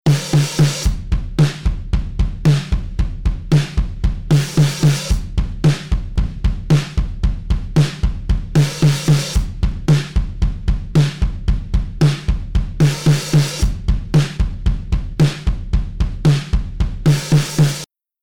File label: drums with more effect